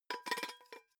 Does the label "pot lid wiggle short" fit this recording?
Yes